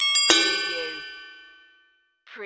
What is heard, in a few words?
Multimedia Bell